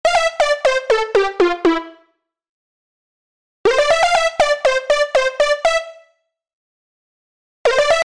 Lead

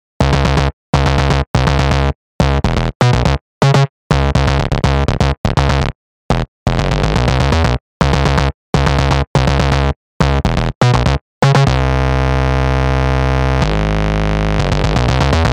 Bass; PatchFactory

